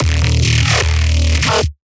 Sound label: D sharp b ass